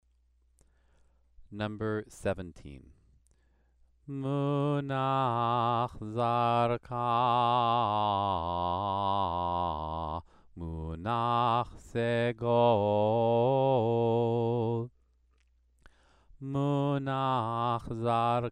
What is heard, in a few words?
Torah trope exercise